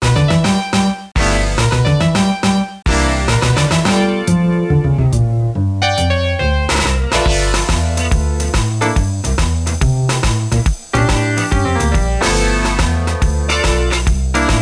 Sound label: jazz; Invenzioni E Inventori 1